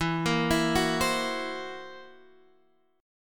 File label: Gb 7th Flat 5th E